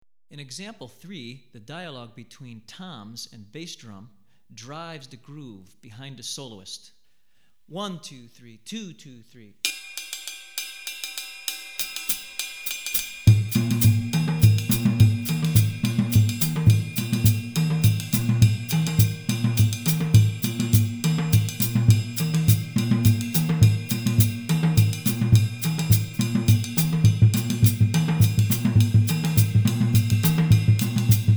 Akom, Second Style Example, West African Rhythms For Drumset; Hartigan